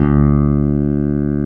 CLAV G3; East West; Global Sounds

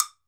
TOKE BELL, African Perc

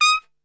D# 4 X PIC SHT, Brass; Best Service; Brass Super Section

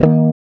D#, Acoustic; Sound & Vision; Gigapack I CD 2; GUI ACOUST.; GUI Acoustic 1

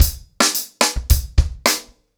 110BPM, ACOUSTIC DRUMS; The Roots Drumloops; Border Case

